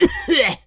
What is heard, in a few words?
Voices voice male cough short